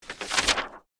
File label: Magazine page single flip